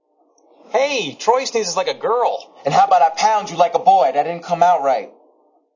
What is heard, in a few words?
sneezes Girl; joel mchale